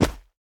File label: footstep normal; smite; vulcan